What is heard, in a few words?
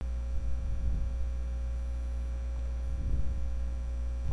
swarm